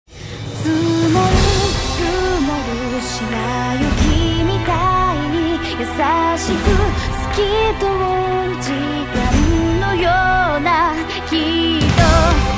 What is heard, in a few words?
Battle BGM